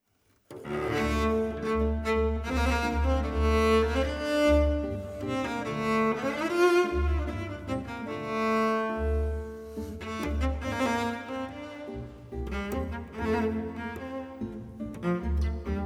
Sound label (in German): solo cello orchester